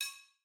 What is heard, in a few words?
slider Decrease Click; lost relics